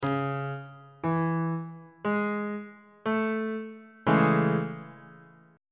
b double flat major 7th 1st inversion on bass clef